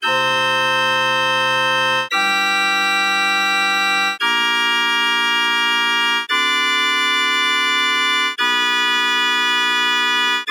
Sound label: Microtonal Chord Progression